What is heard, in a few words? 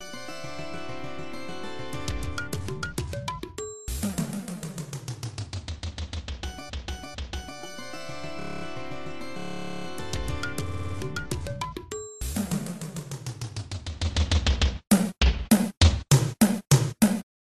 samsung s10 plus ringtone